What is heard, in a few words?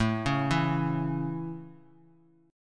A Major A; arpeggiated